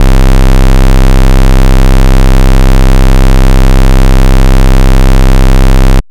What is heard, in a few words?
Roland SH-101 Sub